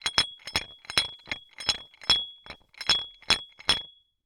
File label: Dumbbells Metal Dumbbells Rattle; Foley